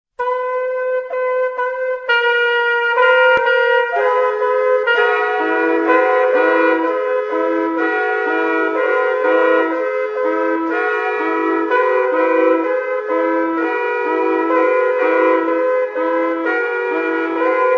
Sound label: Trumpet Ensemble Lithuania; Ocora